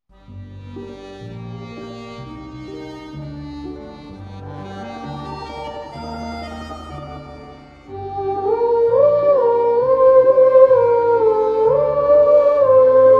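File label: theremin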